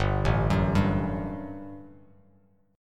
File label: G Major 7th Suspended 2nd, Bass Standard; arpeggiated